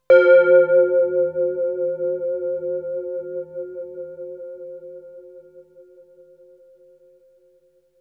Melody oneshot; ZERO G - Datafile DISC 2

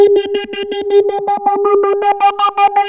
3100 G 5, Korg; Back In Time Records; Korg Universe VOL-1

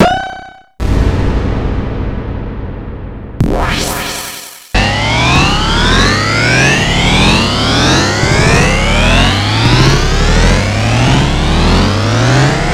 Beeps and Noise

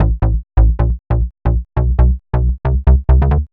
Bass 136A, uk garage 136bpm

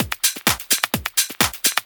Discohouse Loop 128 BPM

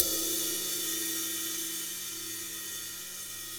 Russ Loop, Laidback; Sonic Foundry (Sony Creative Software); Drum Tools

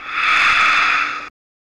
Last Gasp; Zero-G Smoke

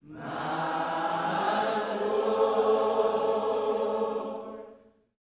MY SOUL, Vocal Planet Gospel; Spectrasonics; GOSP PHR

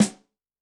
RIM dry, Yamaha DTXpress-II snare

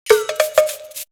Percussion; AMG - Coldcut's Kleptomania VOL-1

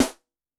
Slappy, Snare; Yamaha DTXpress-II

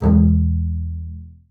E1, BASS SPICC; Xsample VOL-5

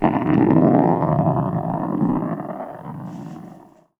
Wood Floor, Marbles, contact mic; musicradar; contact mic madness; Raw

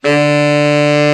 TENOR, Tenor Sax; Tenor Sax 1

